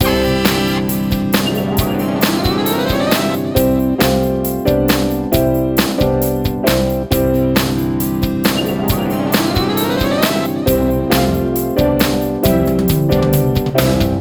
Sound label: race win theme